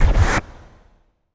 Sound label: Music Record Scratch, record players; Music Effect; Lowered Record Scratch Sound.